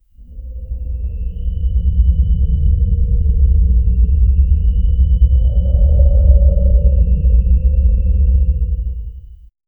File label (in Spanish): sonido ambiente, volcano